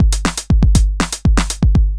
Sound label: Groove Pizza 120bpm